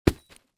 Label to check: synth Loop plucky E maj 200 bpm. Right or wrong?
Wrong